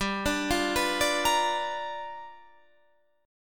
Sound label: G 7th Sharp 9th G, Guitar Standard; G7#9 arpeggiated